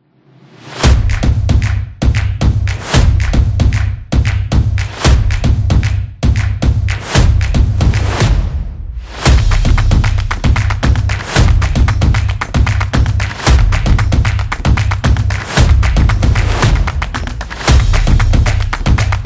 This Is Percussion Action; samba

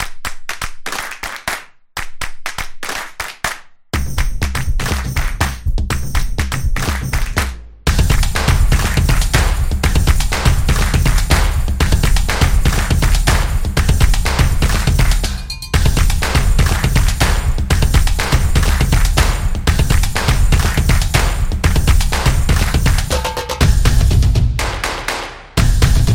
Trade, soccer drums

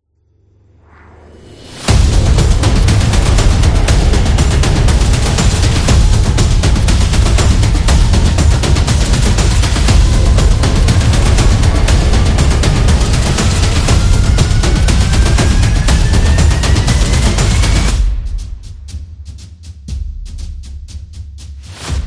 War Trailer

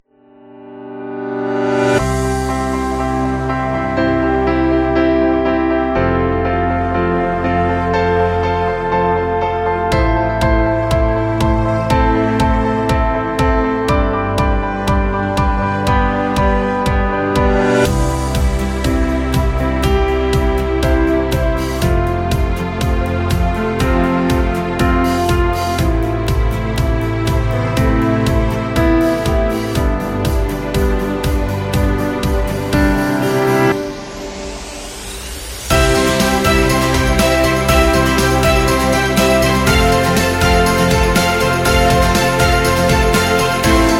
Positive Energetic Corporate

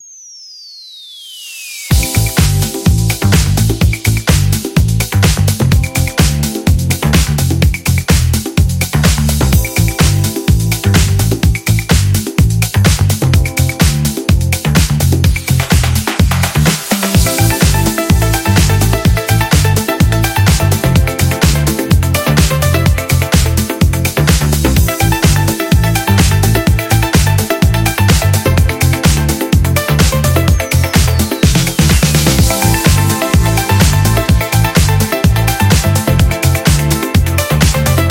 Funky Disco House

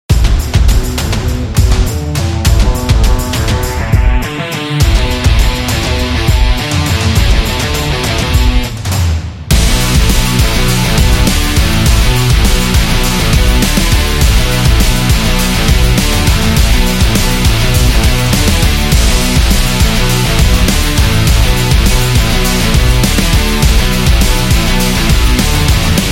Promo Rock

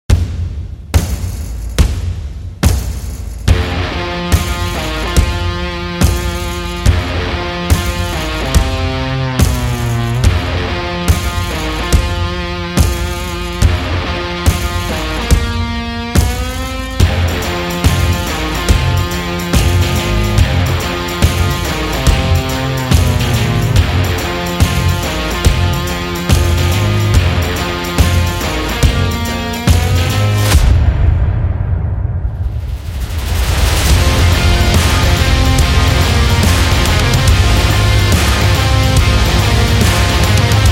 Stomp Rock

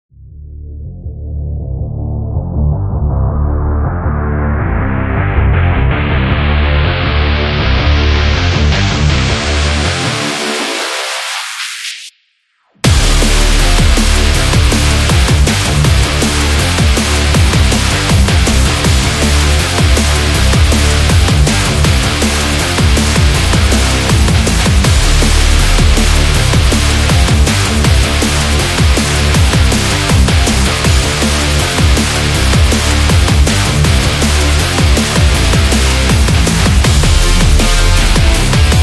Extreme Sport Action; sports training energy

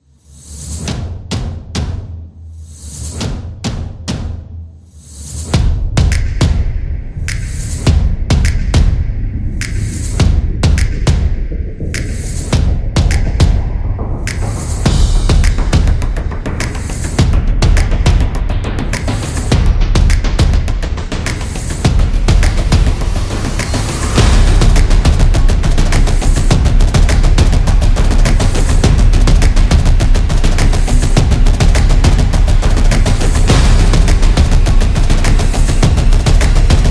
Drums

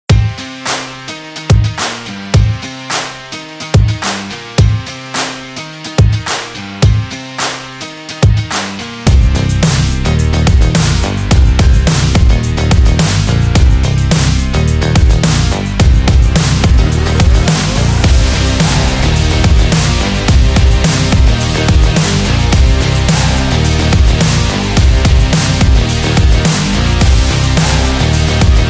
Upbeat Rock

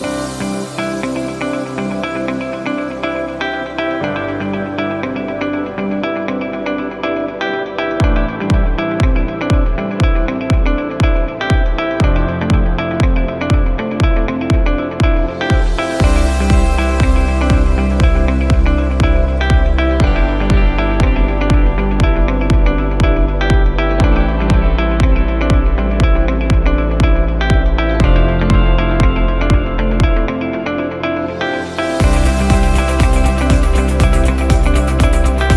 Corporate Loop Inspiring

